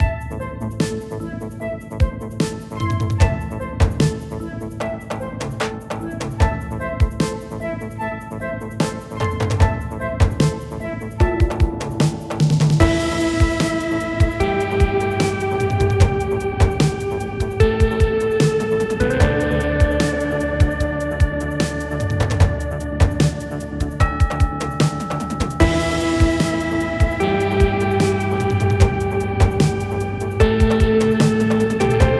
Forest Cryo pour Boucle; Firegirl: Hack 'n Splash Rescue DX

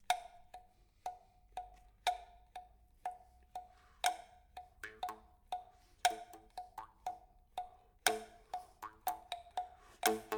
Voix Guimbarde Sanza; gamerip; echo secrets of the lost cavern

